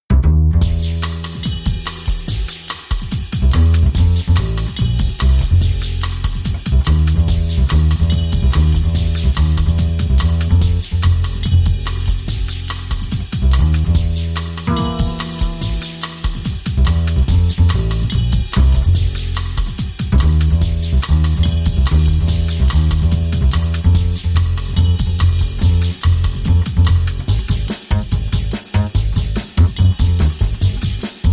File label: Drum and Bass